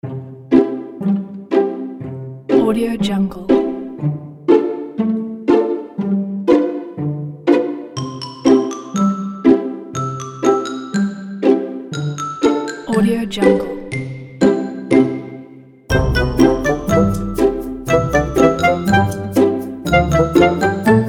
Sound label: sneaky cat